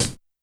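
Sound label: Hi-Hat Closed Break; YAMAHA RM1X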